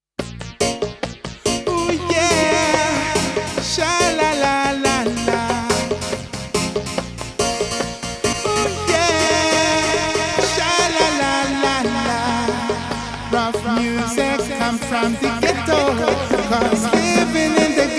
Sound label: ruff dub